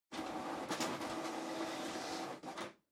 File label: Printer Far Cancel